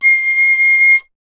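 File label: flute piccolo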